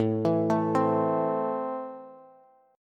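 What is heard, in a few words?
A Minor, Bass, chord strum; JGuitar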